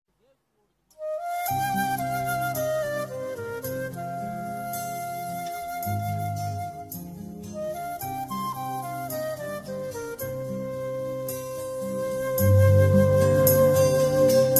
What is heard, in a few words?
minnale bgm flute